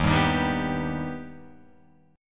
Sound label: Eb Augmented, Guitar Open F; strummed